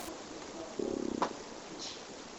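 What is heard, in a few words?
Not sure if it is a growl (Possible Growl); EVP; The Hoffman Mansion; Spirit Chasers of the Unknown; Hoffman House Evp 2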